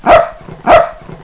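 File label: dog sound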